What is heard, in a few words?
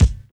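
Hip Hop R n B bass drum; USB Soundscan vol.19; AKAI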